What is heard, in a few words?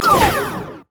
rumble laugh